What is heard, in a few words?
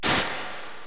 scifi